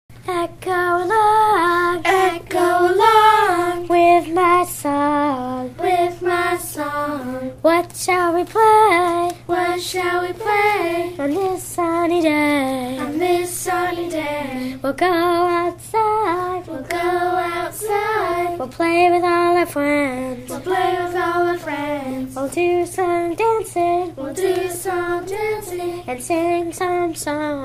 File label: Echo Along, Kindergarten